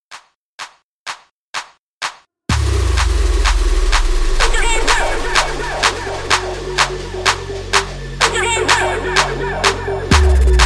Halloween Party 126

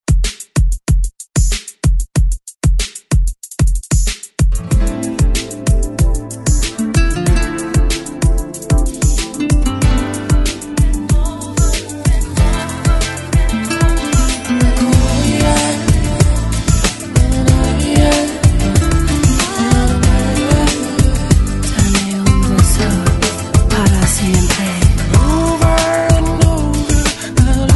90s ReDrum 94Bpm; DJDOLLS

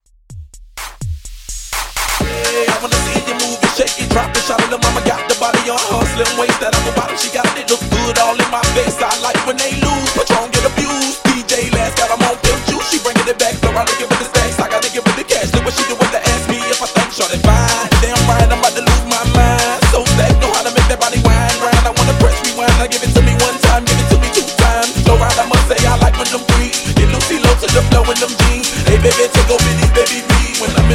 Redrum; Remixfreakz

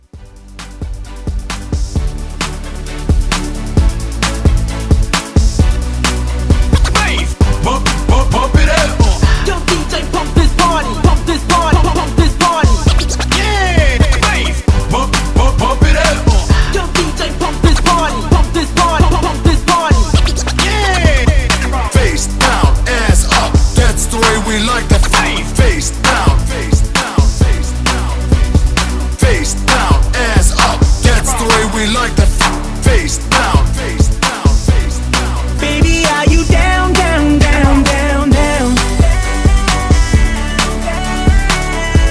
Scratch Partybreak 132

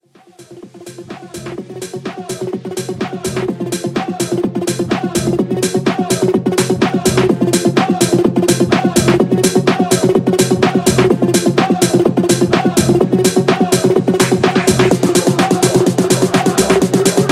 Twerk

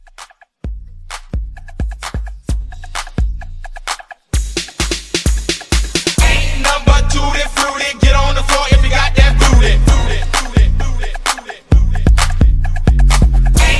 Club Quick W Wo Hype 130

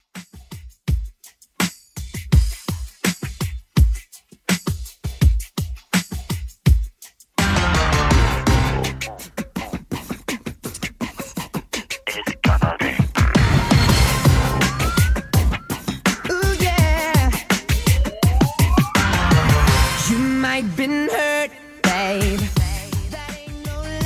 90s Redrum 83bpm

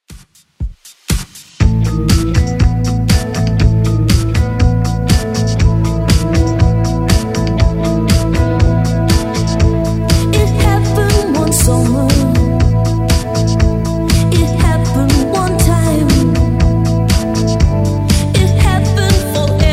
80s Redrum, 120bpm

